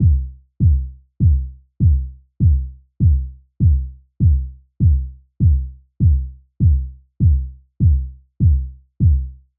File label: Sub Kick; Sample Growing Stream 002